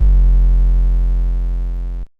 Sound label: 808 Dist Bass